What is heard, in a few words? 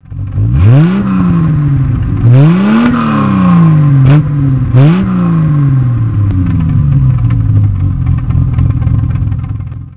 triumph tr5